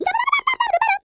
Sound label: SCAT